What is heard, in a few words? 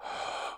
Voices inhale normal; gamerip; the looker